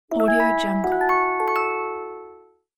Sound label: music box magic ding; interface sounds